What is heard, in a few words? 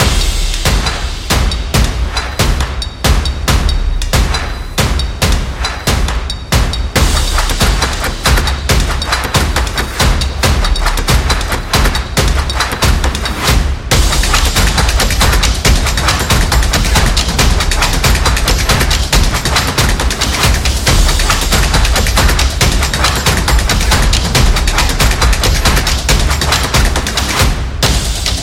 Drums Loop